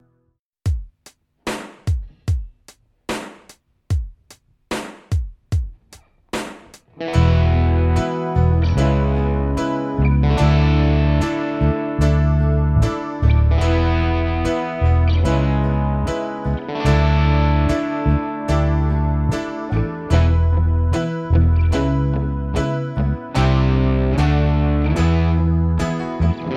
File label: rockballad; play along